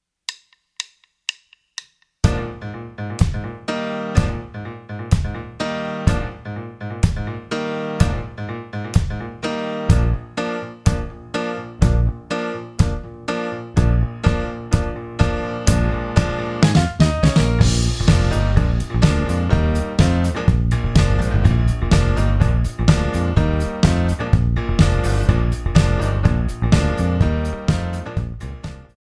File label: 125BPM Bb